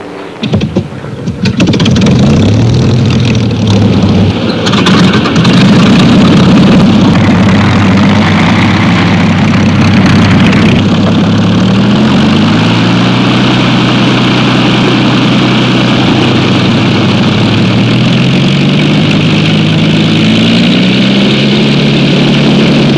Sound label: scratch; warbirds